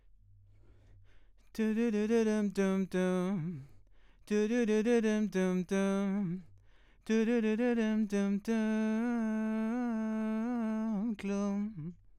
VOX

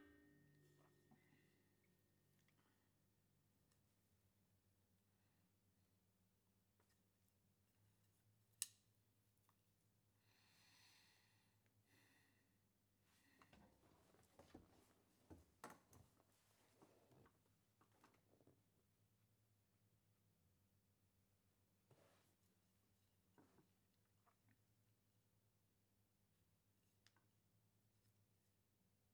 Ride

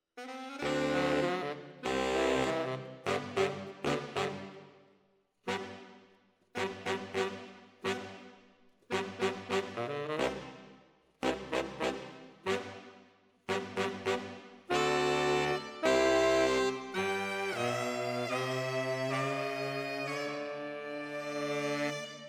ORTF SCHOEPS, piano

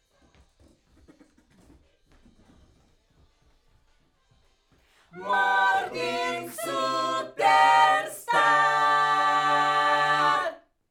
Backs AKG

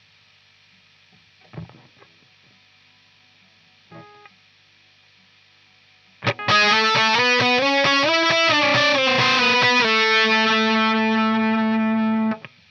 Gtr

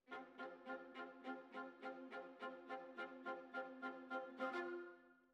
Violas, jazz for piano

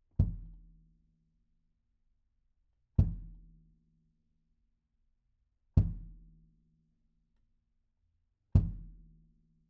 Room

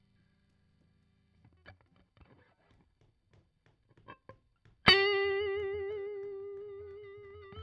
Guit Solo, RNR for piano

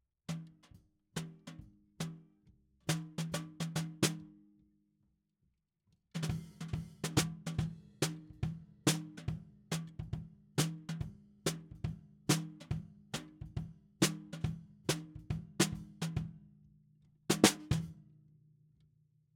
SNR BTM

